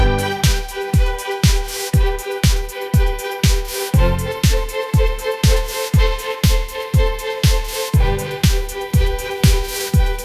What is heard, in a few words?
epic pop anthem rising; ai